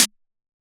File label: snare; goodtakimu drumkit; fl studio; drumkits